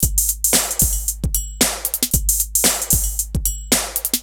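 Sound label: drum loops, right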